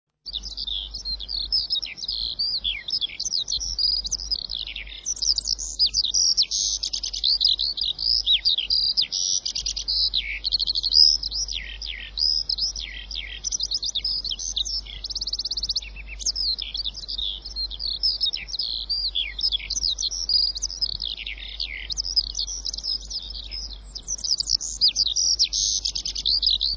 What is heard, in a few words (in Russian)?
javoronok